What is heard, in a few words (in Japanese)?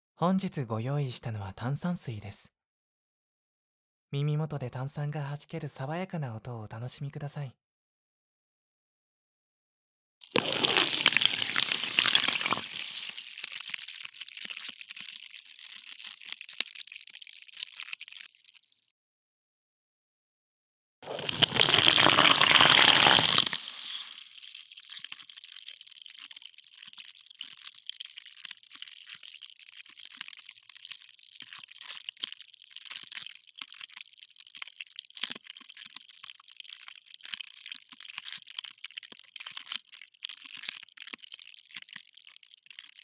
音のリラクゼーション